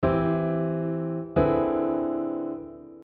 inversions in complex chord structures

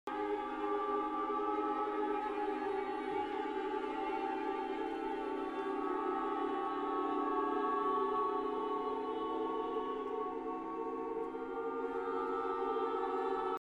Clocks And Clouds; Ligeti

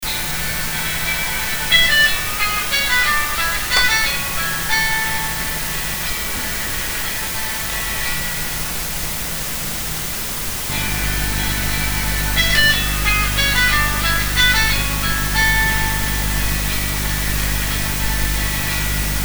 NI vs Bluetube DP

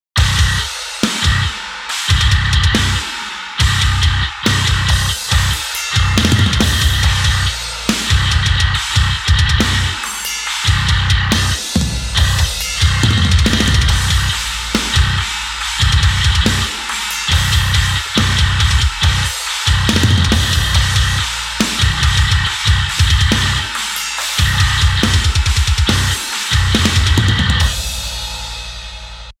bass

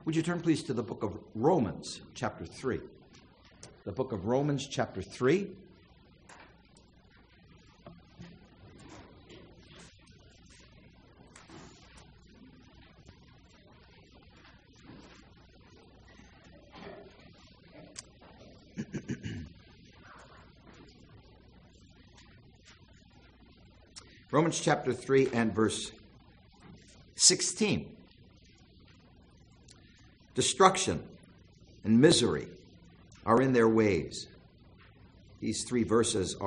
gospel

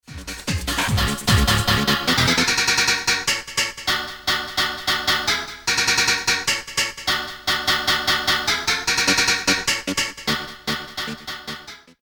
Synth stab

